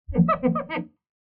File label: squeaky shine polish; soundscrate